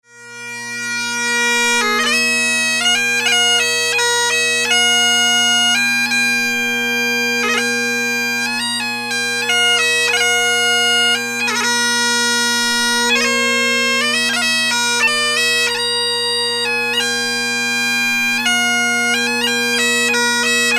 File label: Bagpipes